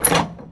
door metal open

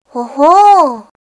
hohoou

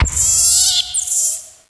rat die

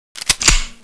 saw cock, weapons, M249

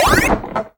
jump; pacman sfxt clasico

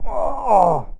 ouch

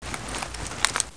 equip nvg

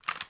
usas12 handle, weapons

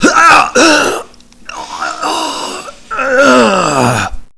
huk uk aaargh

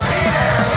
hunt, squeek

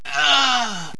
npc death

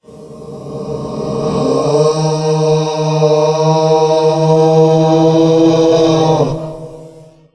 scary moan

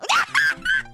koro scream; korone

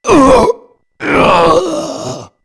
death; broly

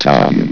tom; vox

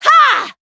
haaahhh

